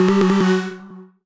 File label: Jingle Pinch